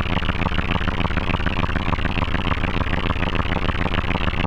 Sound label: TANK, ANALOG SYNTH; AKAI; 1000 Sound Effects